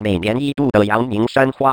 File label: vocal fx 138, Techno; Techno ID; atmo+fx-loops